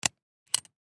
Plane Cessna 172, Plane Cessna 172 Skyhawk Interior Cockpit Seatbelt Buckle; Transportation Aircraft; Airplane